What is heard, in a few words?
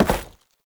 Step Default, Foley; Warframe; Oraxia